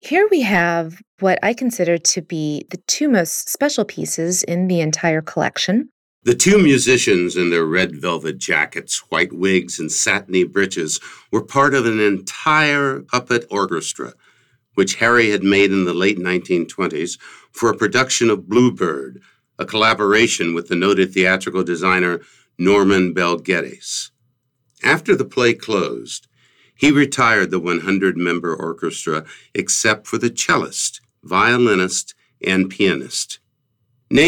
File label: Haydn Trio Violinist